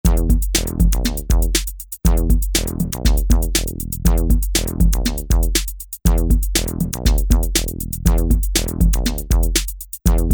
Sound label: Techno